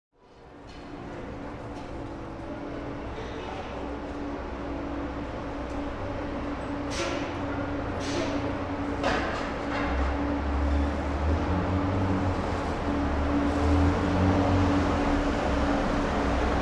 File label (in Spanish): terminal de autobuses